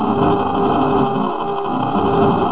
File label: horn